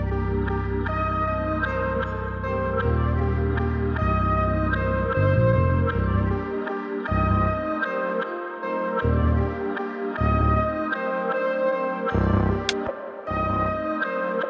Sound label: Ringtone; Samsung Galaxy New